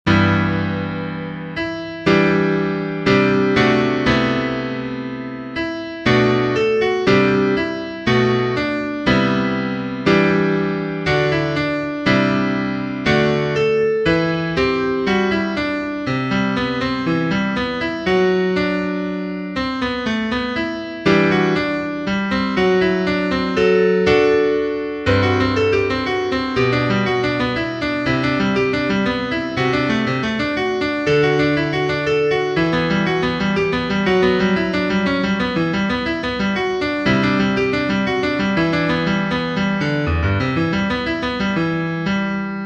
piano

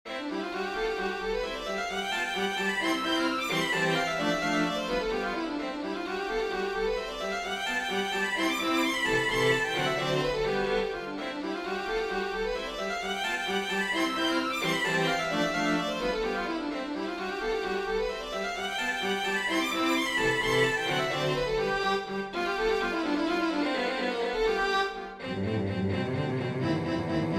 for string quartet; velocity

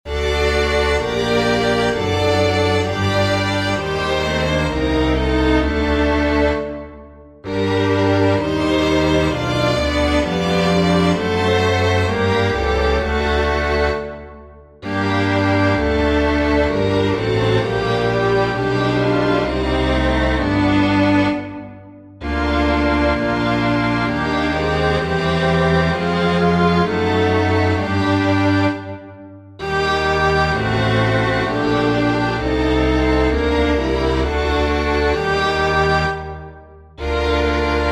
chorale for string quintet; bach johann sebastian; hilf christe gottes sohn

